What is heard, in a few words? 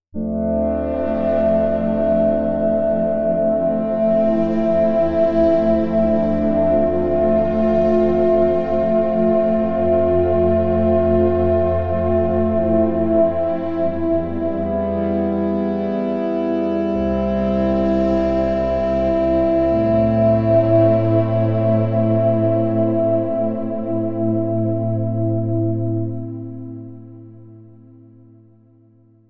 pad